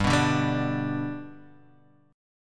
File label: G Major G down Guitar Half Step Down